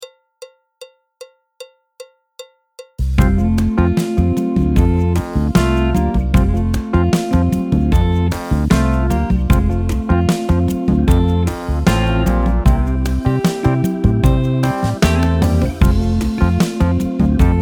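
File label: backing track, Jazz; alto, trombone, piano; Jazz Syllabus Level 1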